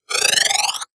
Multimedia Internet CD-Rom Flash Wipe Cartoon

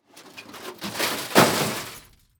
Impact Sliding Crash Dump

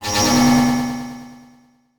Transition Feedback Warble; Adobe; Production Element

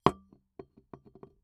Glass Bottle Wood Place Bottle; Foley

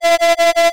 Science Fiction Sci-Fi Electronic Warning

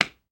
Hand Pat Single; Foley

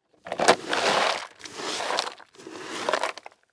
Household Broom Sweep Dirt On Cement Three Times

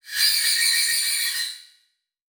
Transition Tremolo; Production Element; Title Transition; AdobeCC

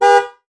Transportation Auto Avalanche Honk Horn Short

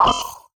Digital; Adobe; Multimedia Internet CD-Rom Flash

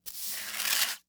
Household Broom Sweep Into Dustpan

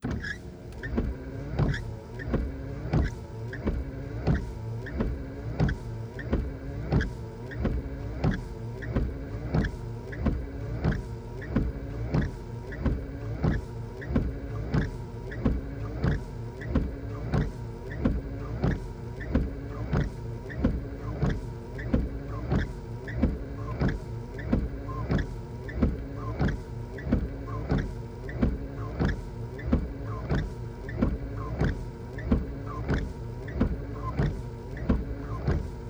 Auto Wind Shield Wipers High; Transportation

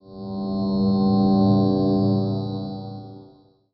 Title Transition Stab Dark Short; Production Element

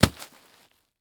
Sports Tent Folded Dropping On Dirt

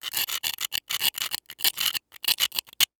Foley Glass Sharp Glass Scrape Multiple

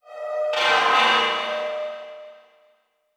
Transition Metallic Hit; Production Element; Title Transition; AdobeCC

